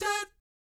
DAT G#4, Vocal Planet Jazz & FX; ILIO; JAZZCOMBO

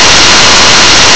STEAM

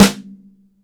MIX SN, DRY STANDARD SNARE

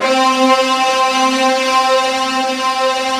big disto; East West - Global Sounds

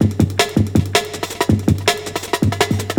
BREAKBEATS

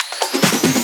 Fills, Loops 140 BPM; Vengeance - Essential Clubsounds Vol.4

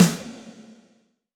Snr Sm Ballad, Alesis D4

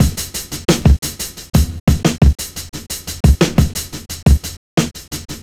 Drums; Sonic Foundry (Sony Creative Software) - Stylus Pressure Urban Grooves on Digital Wax; Matrix Z

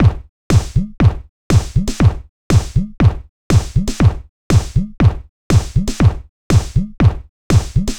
120 trance beat, Drum & Perc Loops

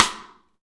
Real Mega Drums; Best Service; Real Mega Drums VOL-1; SN AMBIENTEC

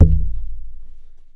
Foley lo

